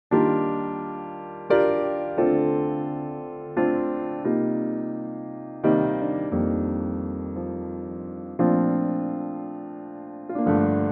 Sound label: Piano